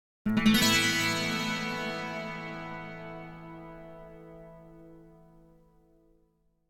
Acoustic Guitar - Minor Chord - Thin And Echo; Hollywood Edge; sound designer's toolkit v2

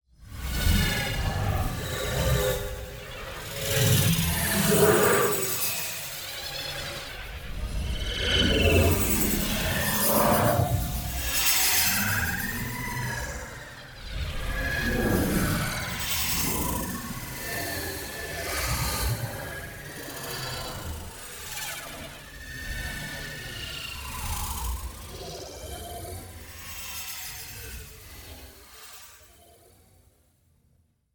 NEON PARTICLES LARGE SLOW; Ethereal Vortex; boom library

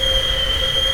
Rocket Missile Loop; SFX Kit; Weapons

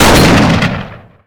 Shotgun Shot, Weapons; SFX Kit

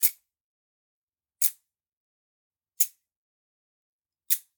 Generic Click Metal Tiny; boom library; The Interface

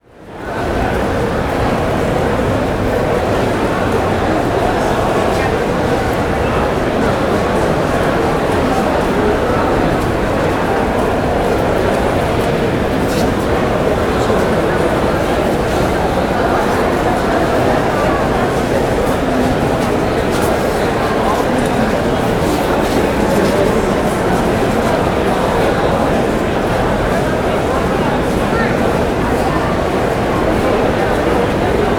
Crowd Indoor Large Hall, Walla; Sound Ideas; Walla Series

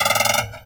Stretch Metal Arm Extend; Foley - Rattles

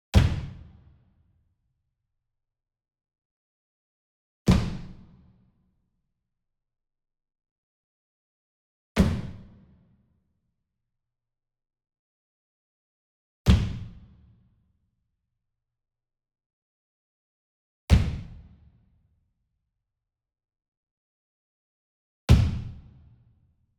Sweetener Foot Stomp Group Medium; boom library; Cinematic Strikes Construction Kit

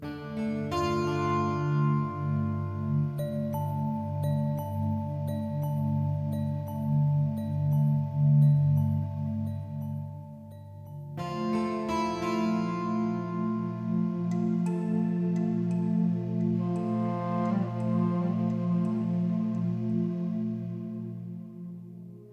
Fantasy Exploration loop; Shockwave Sound - Fantasy Worlds Vol II+III

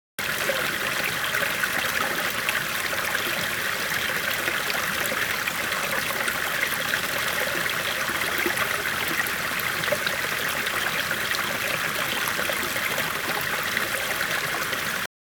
water stream medium; best service Blue Box; water streams

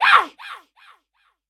Deserter Archer Death; Kilta

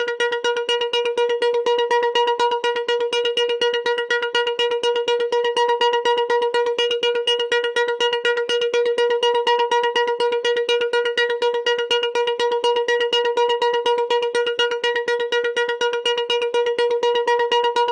pong Distance Warning; Pitch Black Audio Pong

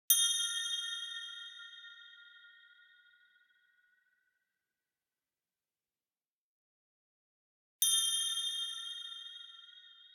BLING Chimes Reverb Freq Shift; boom library; Anime Essentials

